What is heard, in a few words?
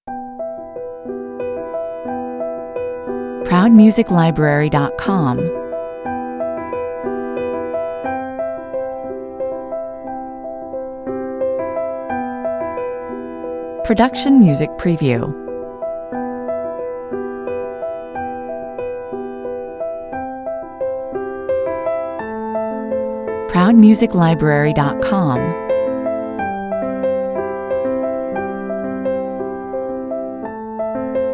piano only